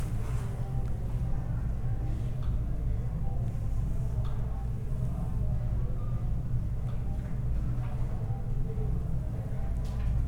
Interior Ambience Large Hall Baby Cries In Background